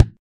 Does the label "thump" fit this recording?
Yes